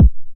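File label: 909 BD tape, kick drum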